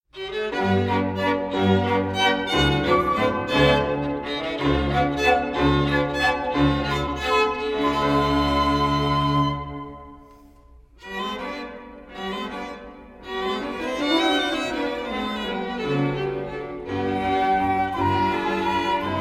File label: quartet sample